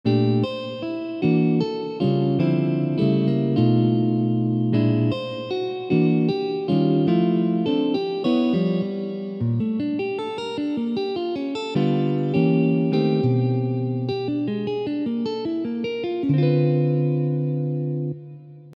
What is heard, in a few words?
etude, jazz guitar